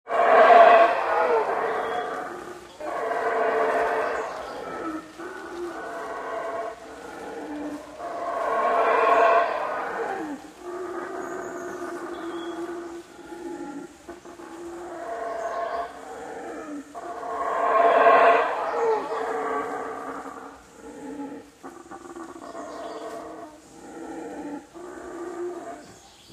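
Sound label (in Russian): горилла издает звуки на природе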